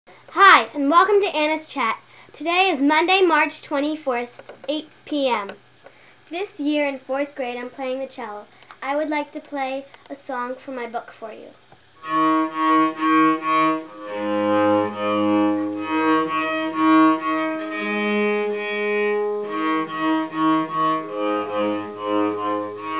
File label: cello